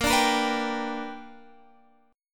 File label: B Minor Major 7th Sharp 5th, chord